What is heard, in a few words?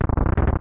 Push Block; Open Zelda; Quest Designer 2.0.4b; Dungeon